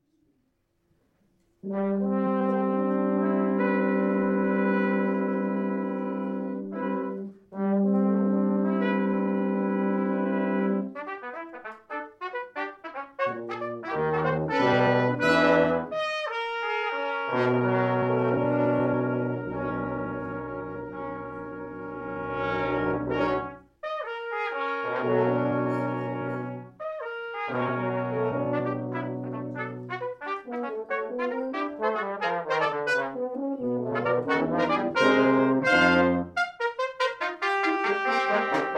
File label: Fanfare